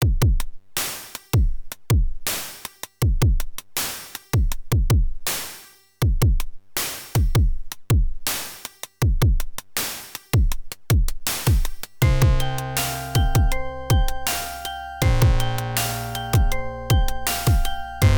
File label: trip hop opl2; weeklybeats 2024